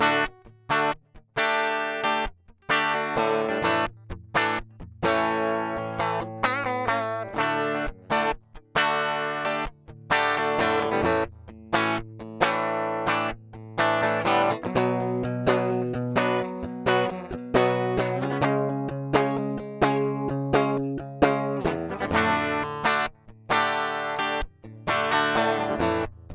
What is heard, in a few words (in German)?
DI Aufnahme UA Volt 476 Comp aus DI Signal